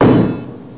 SW WOOD; Thief

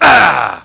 OUCH

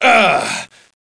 pain, player; SIN; blade

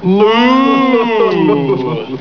oohhh...; On This Date 3.0

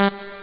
fart

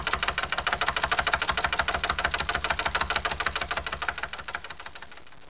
TICKER

